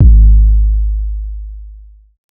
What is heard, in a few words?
808 one shot Pop Ab; Slate Digital BASSHOLE Sample Pack